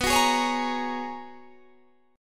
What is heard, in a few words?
G sharp Minor 11th B, guitar chord